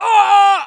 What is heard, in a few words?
hit, pain; dialogue; mohaa